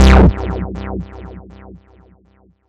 Squelch Bass Aliens

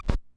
Needledown

